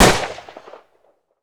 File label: Guns